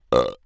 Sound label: burp; OPENAIR; Anechoic